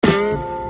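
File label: bass break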